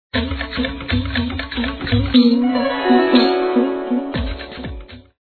Alert; Motorola V600

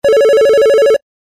Business Ring; LG; UX700 Bliss

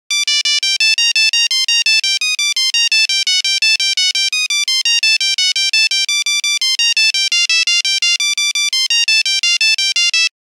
9th Symphony, Nokia Buzzer; Windows Phone apps

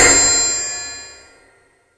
Confirm delete; HP iPAQ Glisten

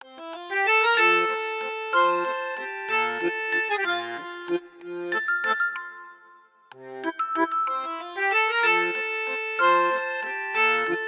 Merry Go Round, ringtones; LG G3 A

